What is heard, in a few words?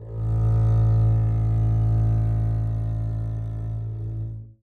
a1 down, bass arco; meatbass